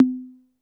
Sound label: D#4 Analog Conga, Yamaha PSR-E323